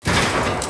gate pound; svencoop; ambience

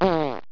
zap, debris; svencoop; cracklife

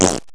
explode, weapons; svencoop; cracklife

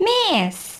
miss; w00tguy; botparty

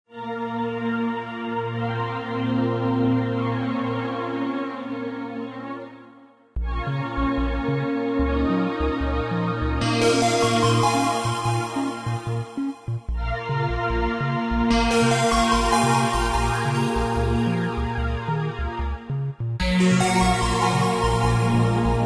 invasion music ambiance; svencoop; angel

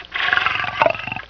breathe; svencoop; gruntsparty; garg

